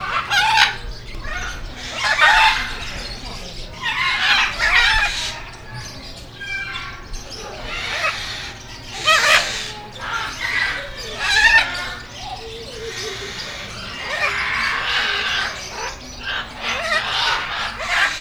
rovid, zoldszarnyu ara; állathangok; nyíregyházi zoo 2010

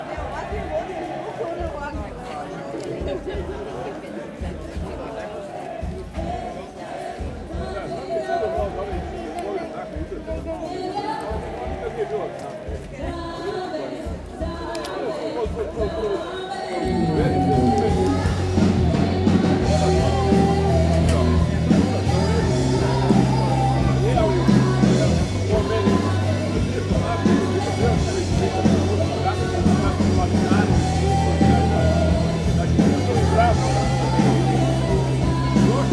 tomegbol tavolban koncert

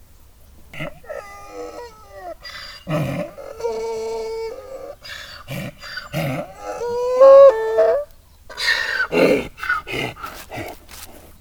nyöszörög, magyar parlagi szamár; állathangok; paraszt udvar

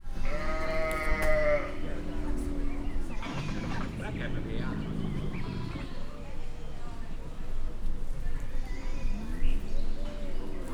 kivezetik a nőstény állatot, kétpúpú teve; Győri Zoo 2014

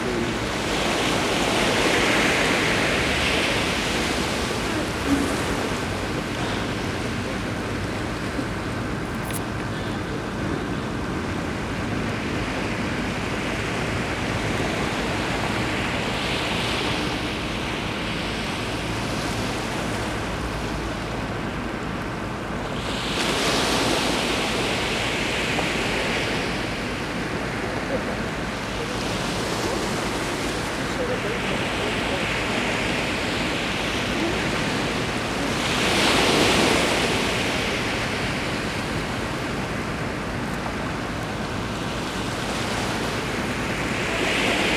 buszvegallomas soderes ocean part

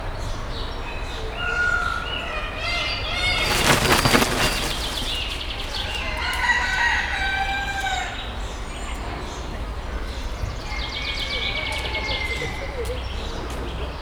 szárnyával verdes, kondorkeselyű; nyiregyhazi zoo 2019

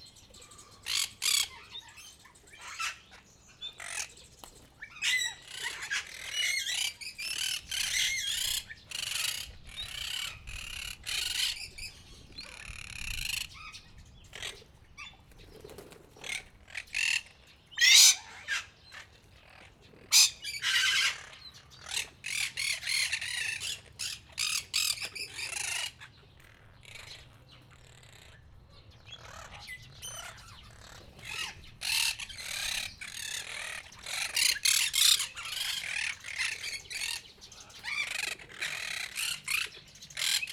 a többiek között egy hangoskodó, barátpapagáj; debreceni zoo 2012

